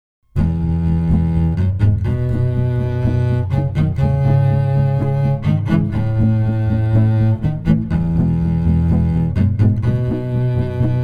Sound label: cello guitar